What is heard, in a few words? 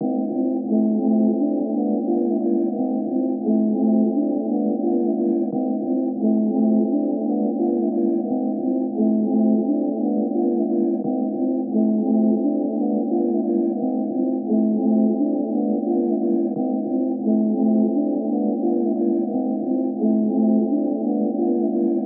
87 BPM A Min, Melody, Trap; Cymatics; 2022 Melody Collection; Trap Pt 2